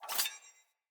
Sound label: Sword Accent Blade; Weapons; Player; Swords; Garren Sword